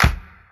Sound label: tape clap single hit c sharp key, Royality free clap sound tuned to the C# note.; single hits one shots